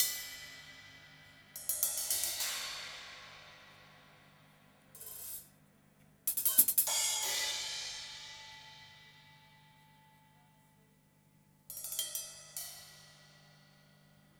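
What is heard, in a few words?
rock drums cymbal, 90bpm; Quazibeat; Rock Heaven Drums; 100bpm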